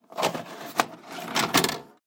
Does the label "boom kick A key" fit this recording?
No